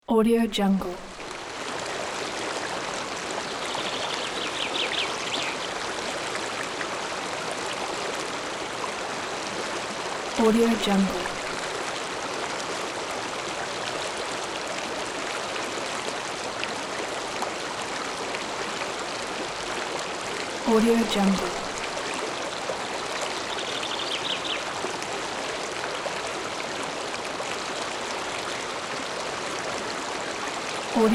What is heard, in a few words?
river in forest